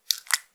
ぱちゅ音単発, ゆっくりめ

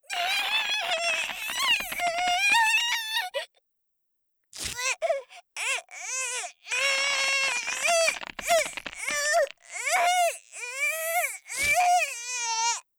皮膚引っ張られる

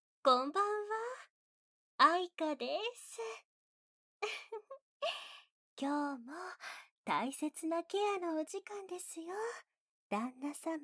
取り合い耳舐め 手コキ 効果音なし, ASMR; ナース愛華といのりママ

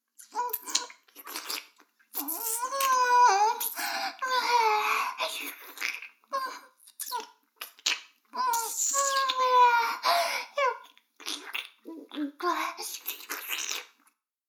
耳舐め音声; 逆時間停止されて

